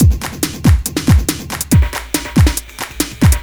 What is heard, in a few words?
Beat, Drums & Percussion; Electrocution; Beats